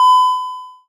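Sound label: short ringing notification sound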